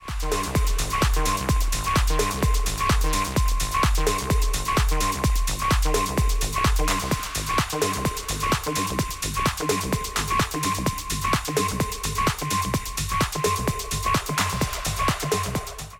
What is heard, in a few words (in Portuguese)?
Techno, musica eletronica; Curso Masterizacao para Musica Eletronica